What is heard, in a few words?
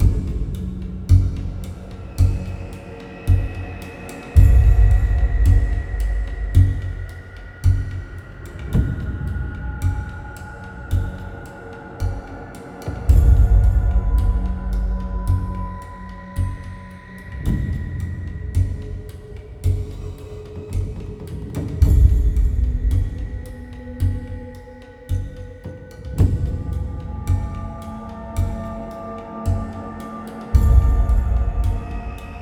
music caution; 1-1 area 4